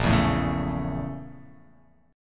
A sharp Minor Major 7th Flat 5th A sharp; A#mM7b5 strummed; JGuitar

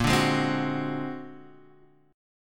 Bb Augmented 9th Bb

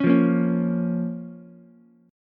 A sharp Minor, Ukulele; 6,5,6,4; strummed; JGuitar

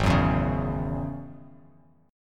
Ab 7th Suspended 4th Sharp 5th down Bass Standard; Ab7sus4#5 strummed

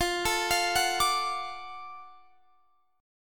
Gb Minor Major 7th Sharp 5th, chord

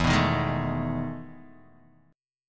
E Major 11th down Guitar Open D

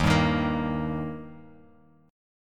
Eb Major, down, Guitar, Open G

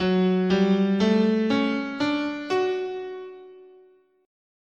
F sharp Balinese Pelog, scale

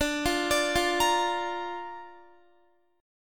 D Minor Sharp 5th, D, down, Guitar; arpeggiated; 4F,4A,5C,5G,5C,6E; Dm#5

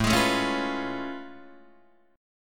A Augmented Major 9th A down Guitar Open D

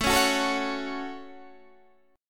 Gb Minor, guitar

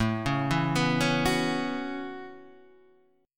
A 6th Add 9th A down Guitar Half Step Down

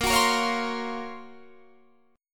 Gb Major Sharp 11th Bb, guitar

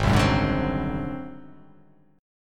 G sharp 7th Flat 5th G sharp down, chord

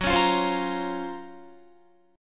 Gb Major Sharp 11th Gb, Guitar; GbM#11 strummed; JGuitar

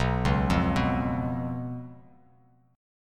B Suspended 2nd Flat 5th, Bass Standard chord; arpeggiated; JGuitar

